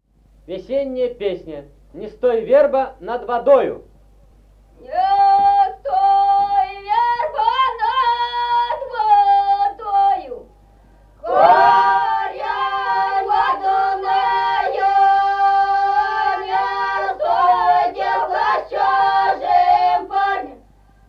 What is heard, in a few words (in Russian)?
весновая; Не стой, верба, над водою